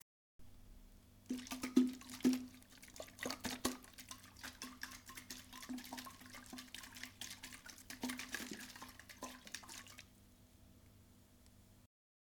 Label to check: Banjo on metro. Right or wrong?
Wrong